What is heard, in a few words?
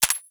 Rifle Mech; Warframe; Weapons; Tenno; Sundial